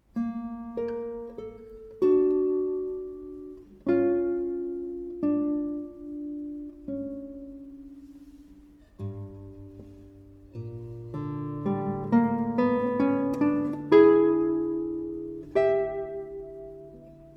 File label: guitar